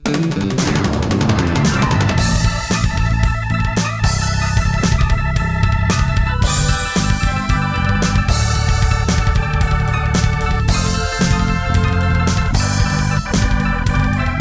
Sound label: male keyboards bass; music smorgasbord